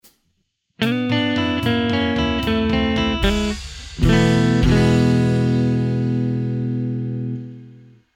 Blues Ending in E

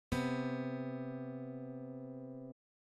intervals harmonic major seventh, guitar

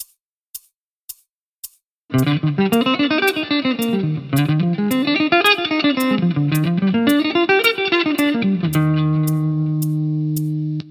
Combining Alternate and Sweep Picking

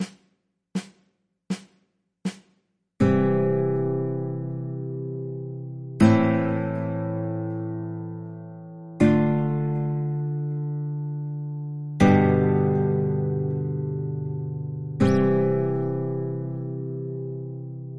chord progressions 50s progression

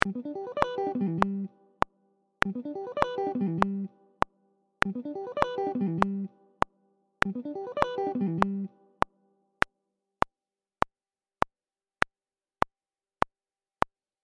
Sweep Picking Gmaj6, guitar

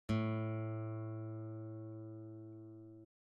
Tuning A, guitar; Stringing and Tuning

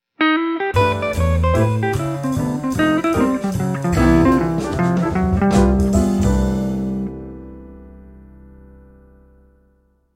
Jazzy Blues Turnaround, guitar

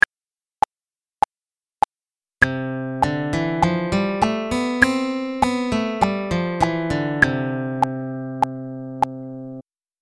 c major scale

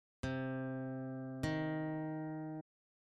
interval minor third, guitar

